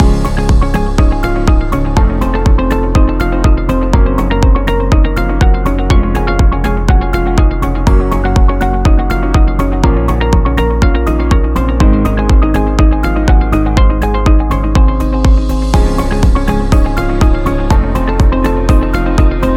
Podcast Loop

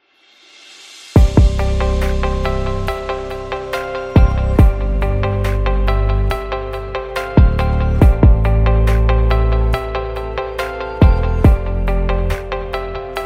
Lofi Corporate

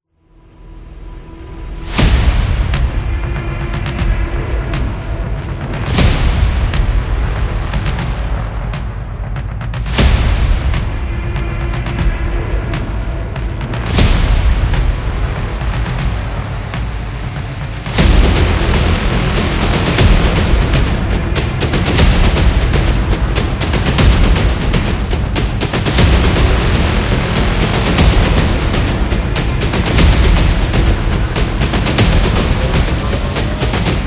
Trailer Drums

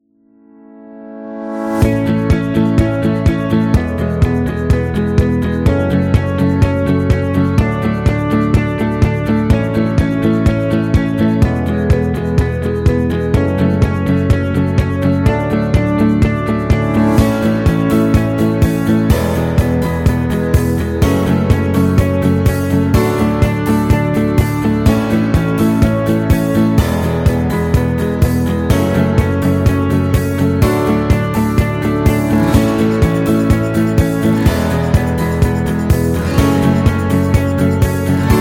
Powerful Corporate, corporate music; corporate music vol 11

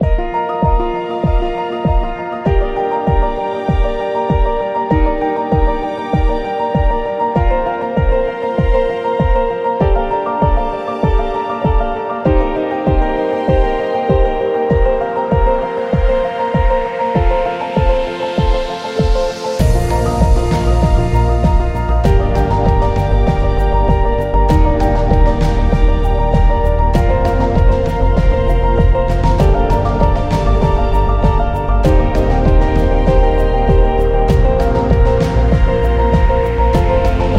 Technology Music, corporate music; corporate music vol 21

